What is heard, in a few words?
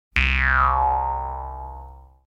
Cartoon Boing Jew's Harp; Household